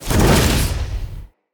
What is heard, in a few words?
Casting Device Cast Dual; Weapons; Player; Fenn; Casting Devices